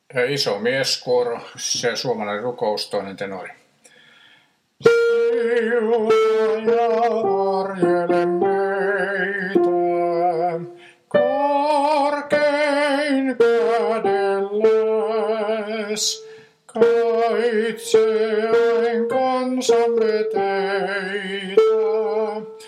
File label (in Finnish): tenori; 2. tenori; mieskuoron